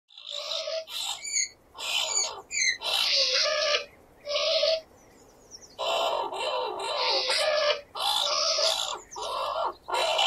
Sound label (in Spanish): SONIDO DE MOFETA